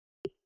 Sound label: movement sounds click in